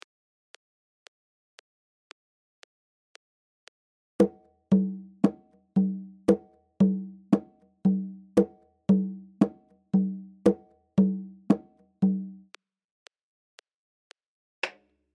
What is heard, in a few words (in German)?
Cumbia 115bpm, lateinamerkanische rhythmen; Lateinamerika